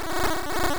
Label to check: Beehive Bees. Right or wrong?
Right